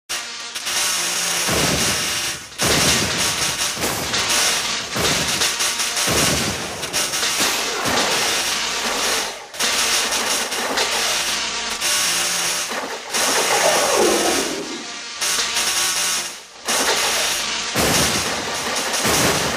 zvuk avarii v laboratorii

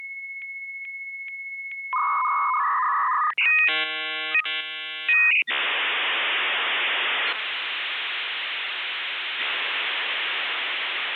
soedinenie modema

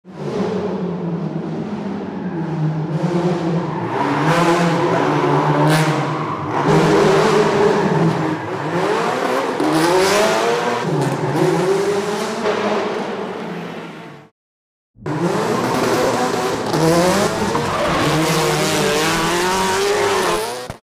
zvuk drifta i eho

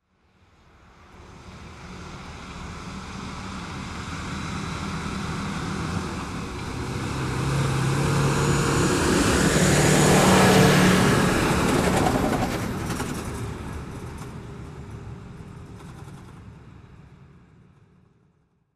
zvuk proezzhajuschego mimo gruzovika

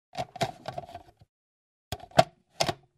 zvuk kryshki miksera

